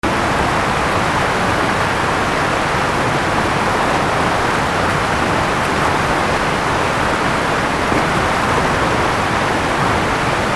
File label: waterfall